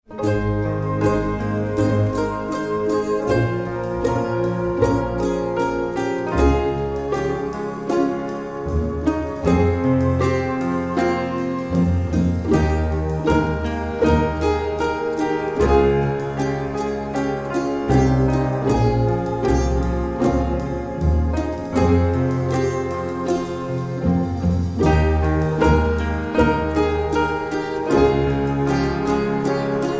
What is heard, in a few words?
Synthesis